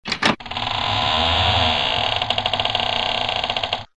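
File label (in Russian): zvuk skripa dveri, door creak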